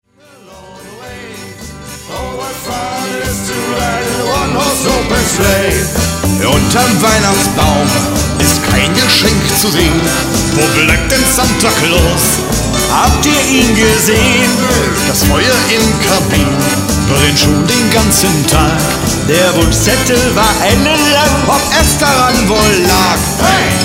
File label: cobblestones jingle bells